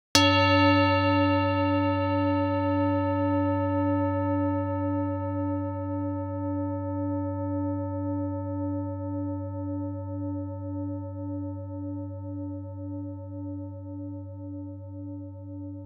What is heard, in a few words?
focusing bell